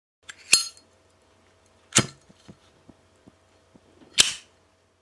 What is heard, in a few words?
zippo lighter; فندک زیپو